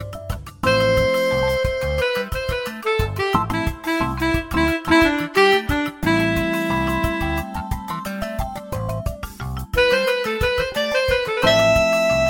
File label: soprano saxophone